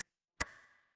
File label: ui click